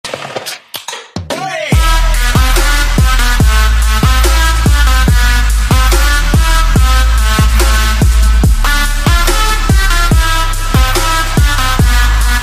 Tetris Remix

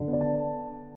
Sound SMS; ShareX

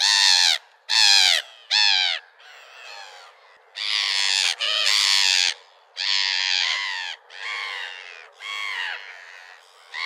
Grey Headed Gull

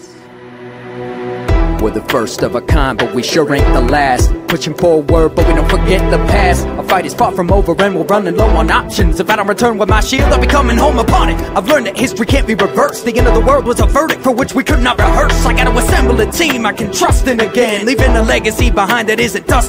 Caps rap

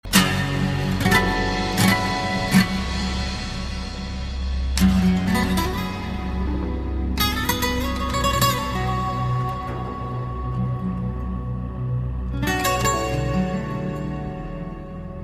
Classical Guitar

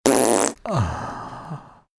Funny Fart

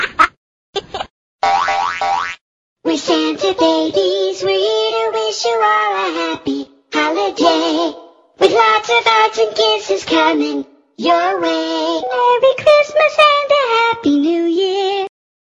Babies Song

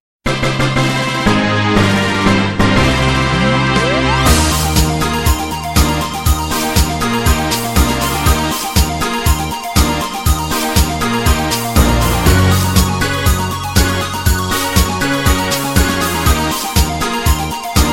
Victory Fanfare; Ffx

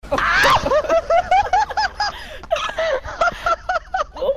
Squeal; Danger

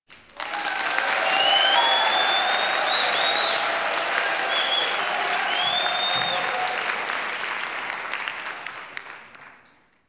Cheer

